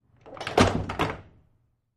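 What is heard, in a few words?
Door Close Wood Rattle, push bar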